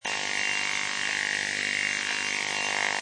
Tv Static Loop, Television static. Interference. No reception. White noise.; Seamlessly looping sound; Science Fiction Sound Effects; LynneMusic